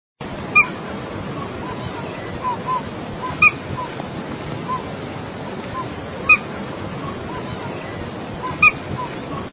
voz maca pico grueso

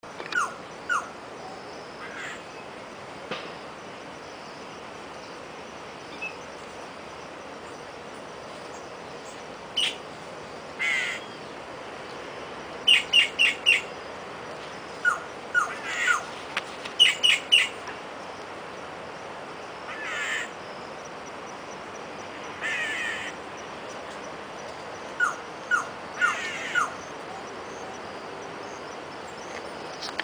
voz boyero negro

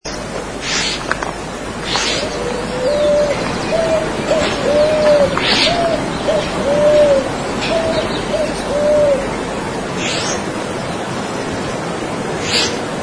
voz tordo pico

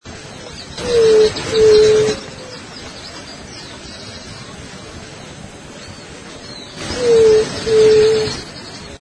voz

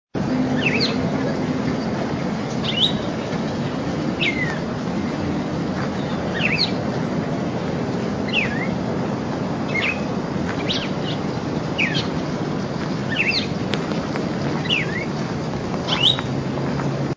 voz cardenal común

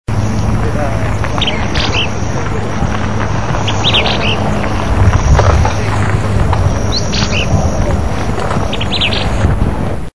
voz fiofío pico corto

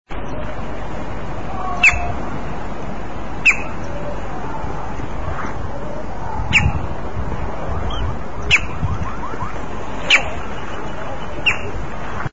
voz carpintero real

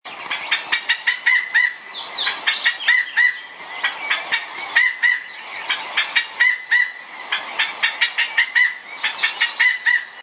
chiricote, voz

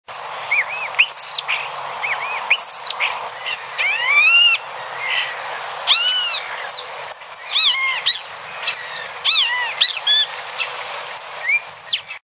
voz estornino pinto

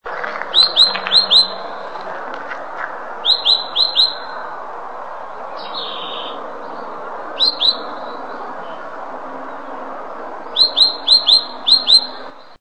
voz tacuarita azul

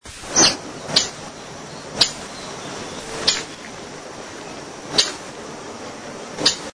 voz benteveo rayado

